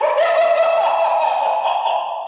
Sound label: laugh haunted house; Voice; ooh ahh; disney haunted house